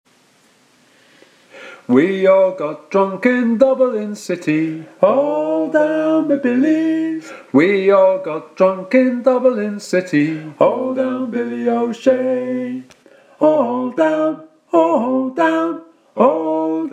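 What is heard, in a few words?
alto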